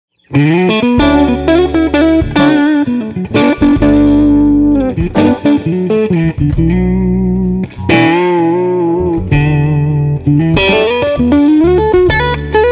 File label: 63 2nd Position Dirty, pickups; 1963 Epic Series Stratocaster Pickups